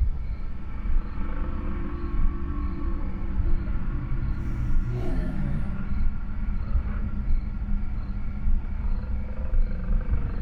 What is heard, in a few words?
Amb; Acheron